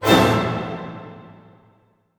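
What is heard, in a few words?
A minor fortissimo, orchestra hits; Sound On Sound - Peter Siedleczek's Orchestra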